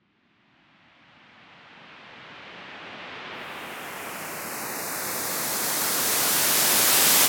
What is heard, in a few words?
white noise riser